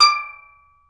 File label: D5 TENOR, STEEL DRUMS; AKAI S6000 CD-ROM; WorldSounds